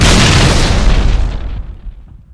plasma explosion

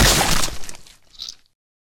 chomp sound

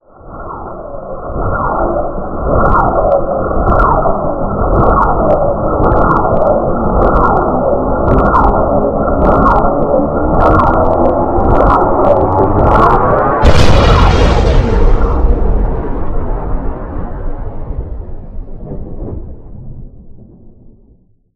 astro warp

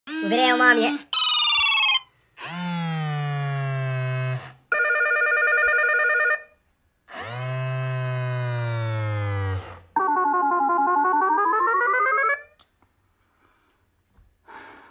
ringtone slowed

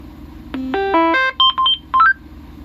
motorola startup

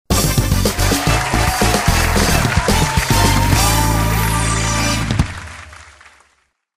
chime; sonic; phantom ruby

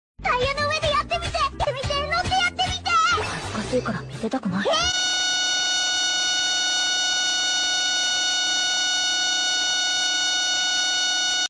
heee heeeee

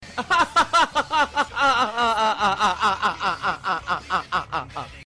laugh

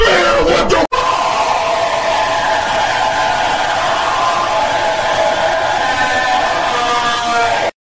scream; chronos

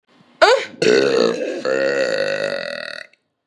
burp extreme

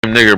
wakeup tune

